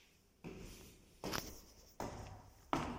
stairs

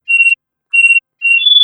commlink beep

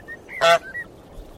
honk

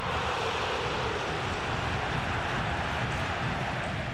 uuuhhh; futbol

